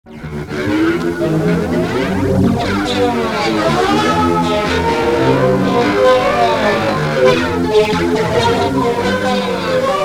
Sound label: samba